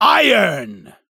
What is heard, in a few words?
Laser Pointer